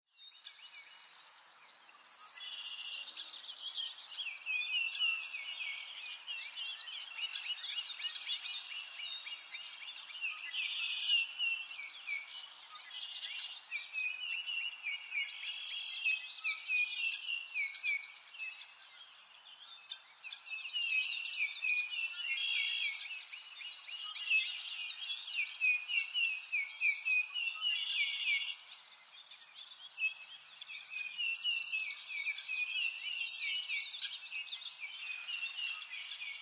Nature Ambience Birds In A Forest, Light forest ambience with little birds singing. Peaceful; pretty and relaxing.